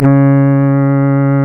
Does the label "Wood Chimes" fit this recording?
No